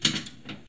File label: Pinball Slingshot Ball Eject